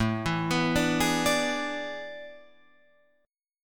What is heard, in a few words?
A Major 7th Suspended 2nd Suspended 4th, Guitar, Open F; AM7sus2sus4; arpeggiated; 9,11,11,9,11,9